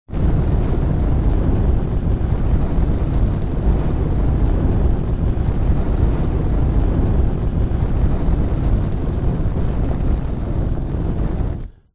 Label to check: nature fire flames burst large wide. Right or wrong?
Right